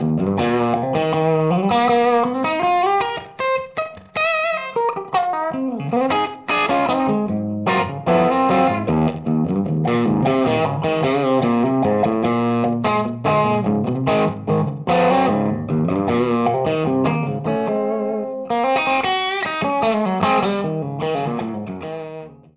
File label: Clean